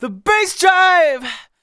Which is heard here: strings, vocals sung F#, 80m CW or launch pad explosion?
vocals sung F#